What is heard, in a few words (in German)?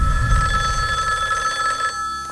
Telefon; fun matrix cz